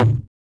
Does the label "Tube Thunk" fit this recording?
Yes